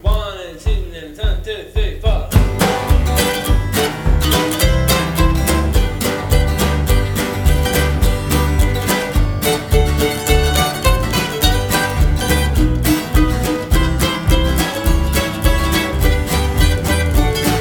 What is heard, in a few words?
COUNTRY commercial